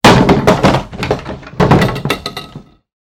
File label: plate dropped on floor